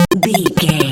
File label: retro synth beeps groove